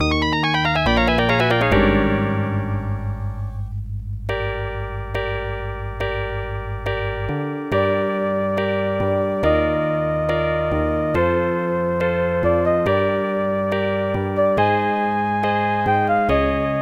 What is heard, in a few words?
Defeat; Another Star